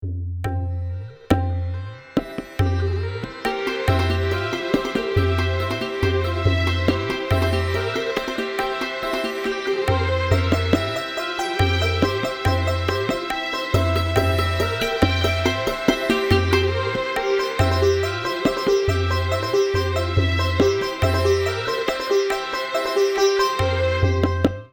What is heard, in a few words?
Sitar Reveille short